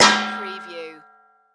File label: Frying Pan Hit Metal